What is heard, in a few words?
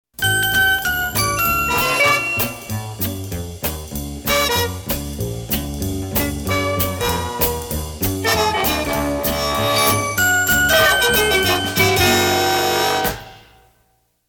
Happy Jingle; Pams